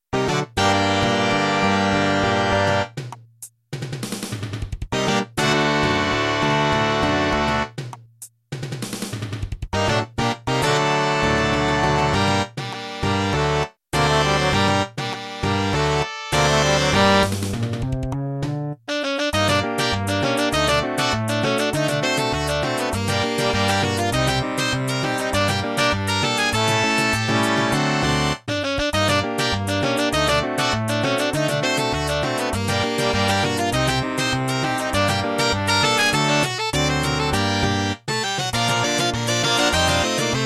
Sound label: big band